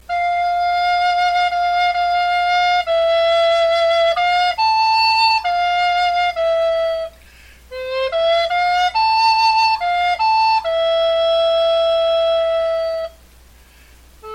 lullaby alto recorder

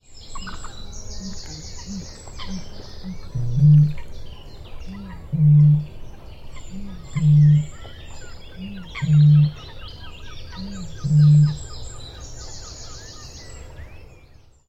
bittern booming

